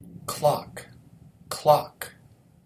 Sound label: clock